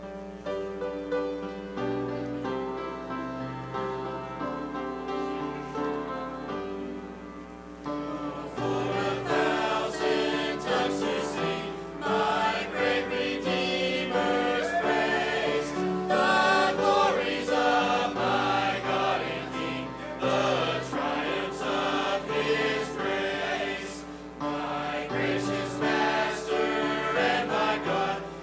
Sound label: singing Young Adults